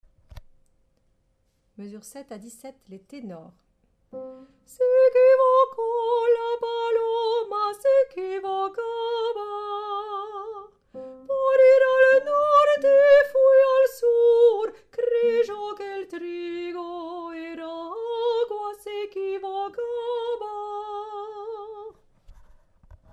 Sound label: paloma Tenor